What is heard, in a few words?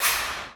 Air Wrench; Drum Machines; Alesis D4; Efx